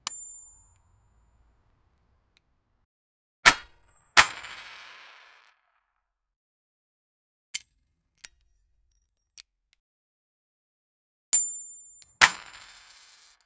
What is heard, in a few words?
gun shoot coin sound fx; bullet